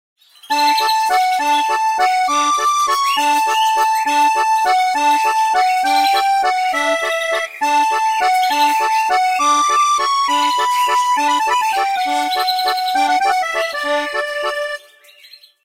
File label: Morning, alarms; WAO